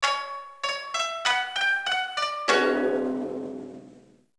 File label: Bell of Regrets; power instinct; arcade; Oume Defeated Demo